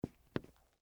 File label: Dress Shoe Single, Step, Concrete; Footsteps; BlastWave